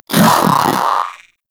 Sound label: Companion Vocal Bark Double; Pets; Warframe; Zanuka